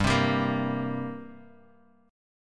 G Minor Double Flat 5th, Guitar, Half Step Down

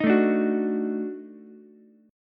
Eb Minor Major 7th Flat 5th Eb down Ukulele; EbmM7b5; strummed; JGuitar

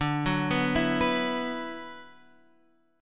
D 6th Add 9th D, Guitar, Half Step Down

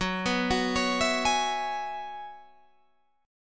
Gb 9th Suspended 4th, Guitar Open G

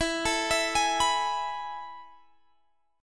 A Major 7th Suspended 2nd

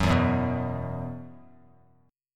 E Suspended 2nd E, down, Bass Standard

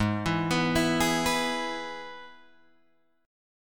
G sharp Minor Sharp 5th, Guitar, Open G

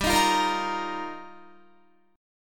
G Minor 7th Sharp 5th, Guitar; Gm7#5; strummed

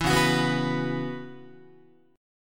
C sharp Minor 11th, Guitar; C#m11; strummed; JGuitar

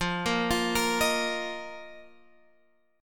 A sharp Suspended 4th F

